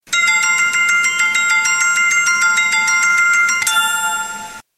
Slot Machine Win; IGT

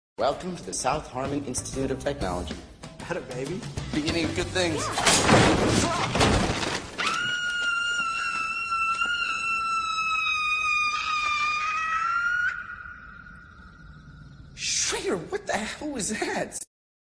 scream

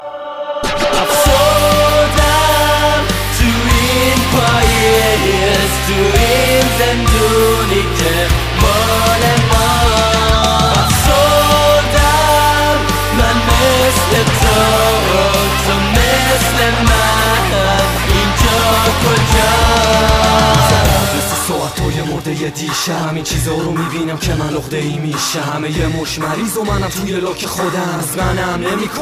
minimal loop, electronic ringtones

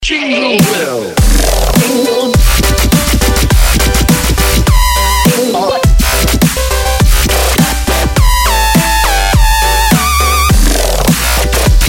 Jingle Dubstep

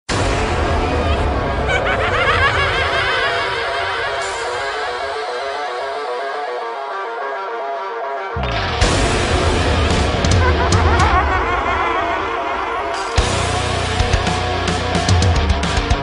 game over; shi no numa

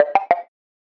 Notification

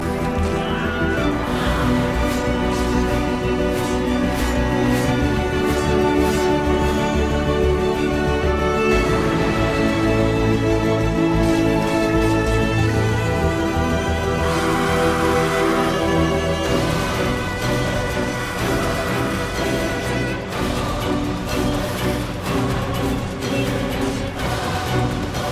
MARIMBA

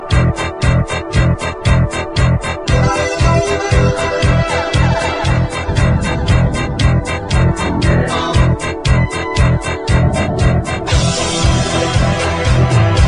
relax remix

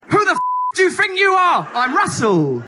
Acoustic

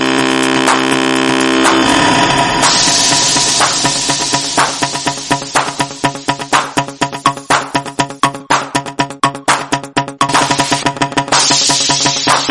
Roar

